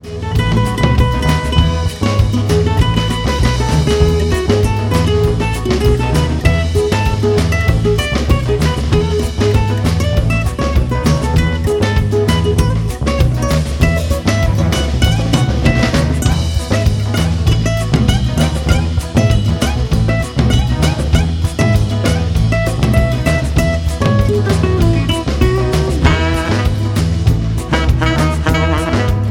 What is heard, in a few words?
Ringer Afro